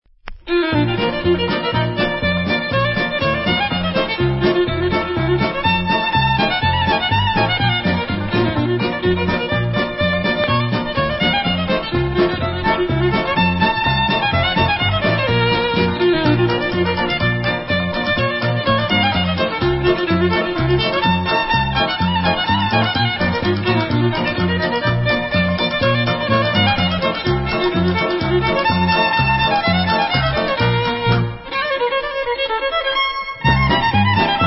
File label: Clarinet Polka